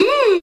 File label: mmm